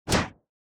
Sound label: Motion Slam Dry